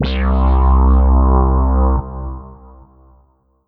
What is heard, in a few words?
Kawai K5000W Rezonato C2